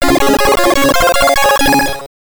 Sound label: Victory Jingle; ottifanten kommando stoertebeker; gb